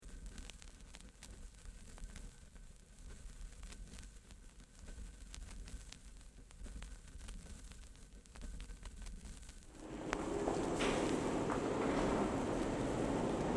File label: 1938 Stock Exterior - Doors close; departs, Tube